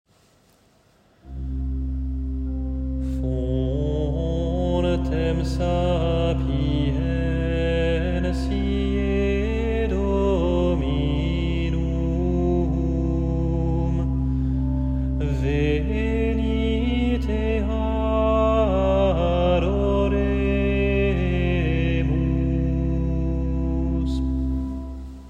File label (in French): antienne invitatoire latin; docteurs